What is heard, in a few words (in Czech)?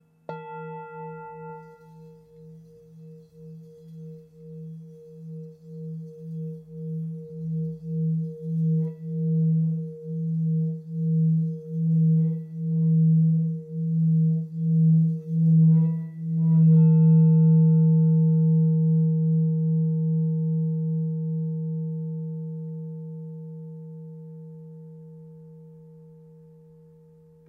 tibetska misa